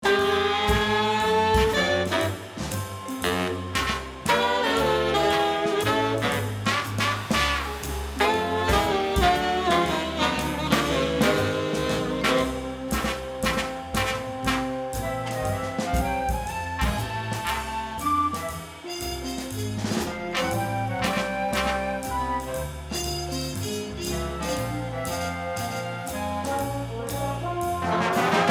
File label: Strut; Sewer